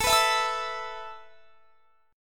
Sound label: A Suspended 2nd A; Asus2; strummed; x,24,21,21,22,24